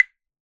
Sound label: Chinese Temple Block; Percussion Samples